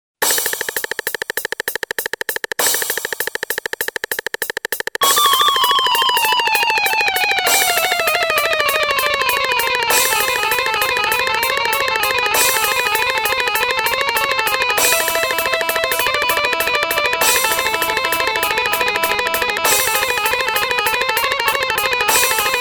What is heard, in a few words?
bumblebee